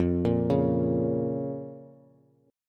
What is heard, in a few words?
F Suspended 4th Sharp 5th F, Bass